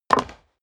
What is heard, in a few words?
step high heels wood creak jump